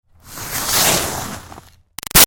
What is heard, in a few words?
Snow Slide By Icy Scrape Smooth; Skiing and Snowboarding; Sports; Big Room Sound